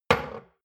shot glass place table hard wobble short; berufe; gastronomie; soundpool

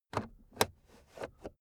car backseat table pull in cupholder slow

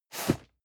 cardboard box medium slide short hard; objekte

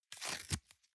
box small tear apart soft

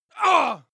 male attack groan; schreie; menschen

